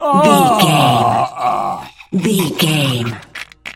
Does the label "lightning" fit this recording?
No